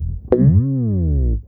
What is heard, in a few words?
EFX SLIDES, bass; Sampleheads; Ultra Freakin' Bass